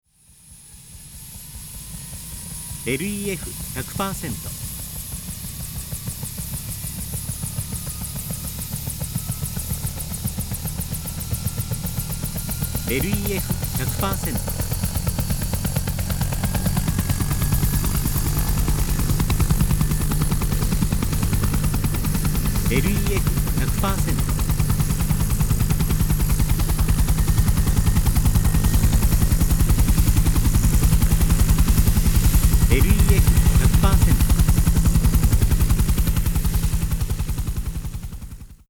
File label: heri